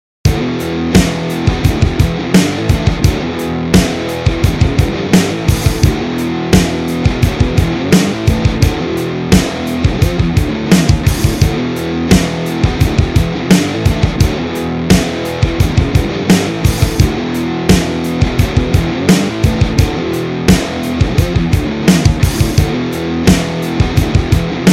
Strumming modern rock

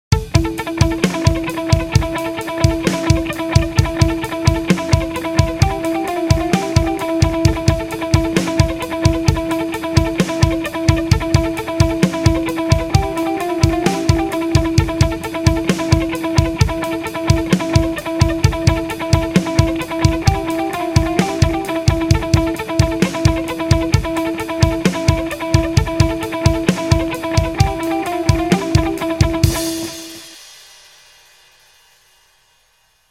style riff

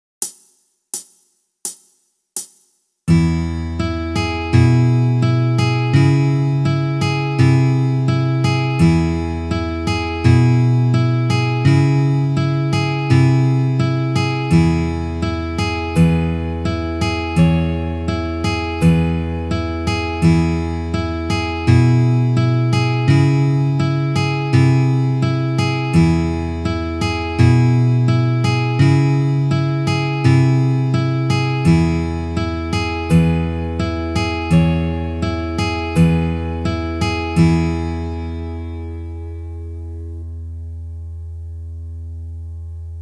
Ballad

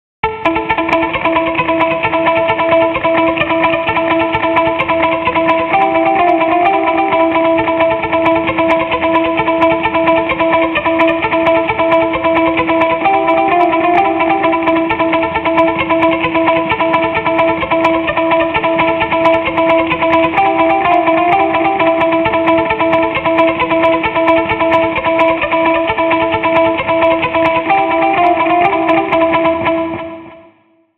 delay solo, guitar

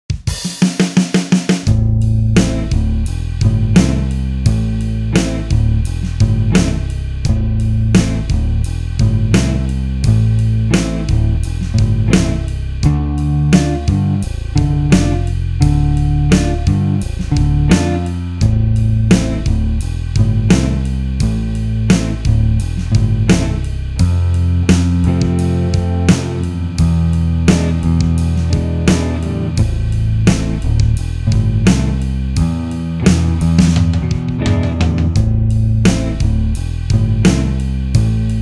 Maximum Pentatonic Power instrumental

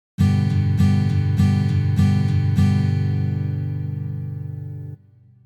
piano strum

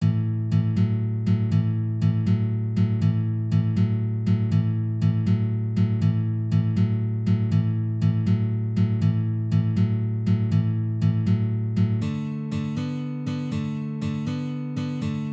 12 bar blues shuffle slow

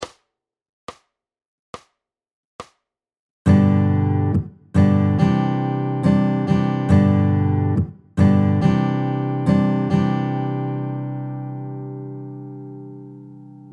varying strum patterns hit

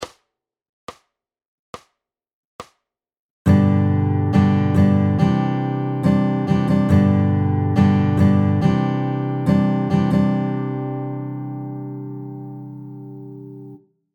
varying strum patterns ballad flick, guitar

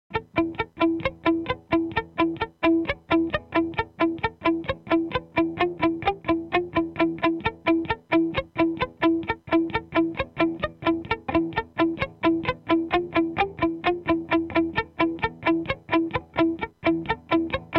style riff no delay, guitar

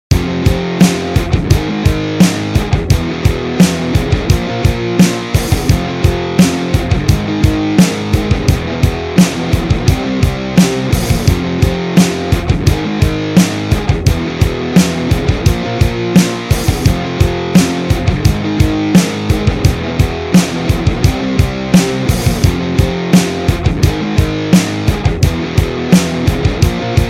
Strumming audio indie rock rock

